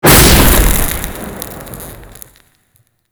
Explosive Arrow, Explosions; Warframe

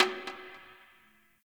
Garbage Metal Can

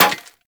Bullet Impact Generic Metal; Warframe

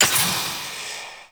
Crewship Weapon Mech Clip; Warframe; RailJack; Corpus Crew Ship

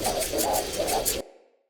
Dagger Throw Loop; Weapons; Player; Swords; Fey

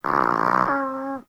Kitten Snore; Warframe; Catbrow

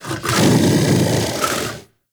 Kubrow Armored Vocal Breath Walk; Warframe

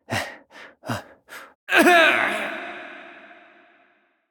Breaths; Amir; Warframe; NineteenNinetyNine; Stage12PartyOfALifetime

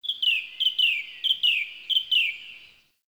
Bird Thrush; Warframe; Ambience; Nineteen Ninety Nine

